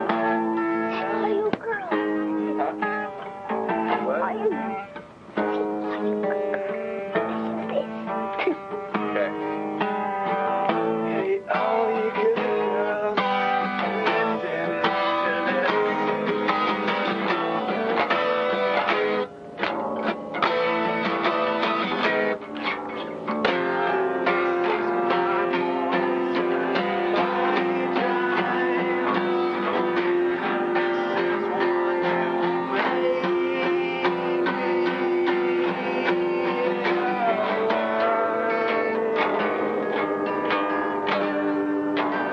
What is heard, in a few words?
all your guitar